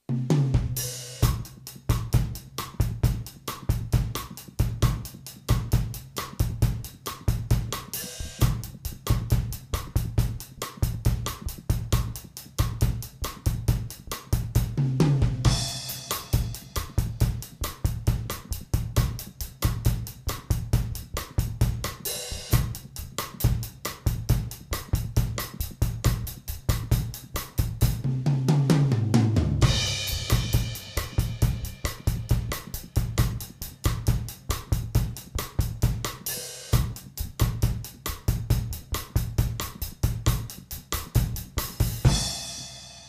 Bossa N Samba, Starts with general Bossa Nova time, then Samba-style playing.; Various BPM (no click); drum